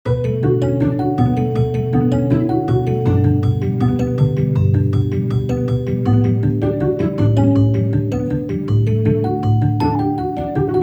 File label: Pop Music